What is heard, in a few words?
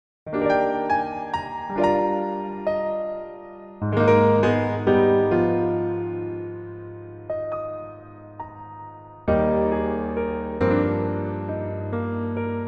Piano MR, 멜로디MR